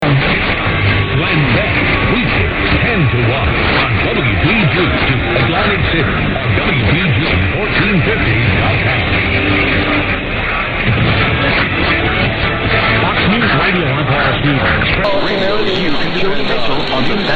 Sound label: montage, radio; Mediumwave Dxing